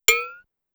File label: Cowbell Up, Pitch Env; Clavia DDrum-AT; Pitch Env Various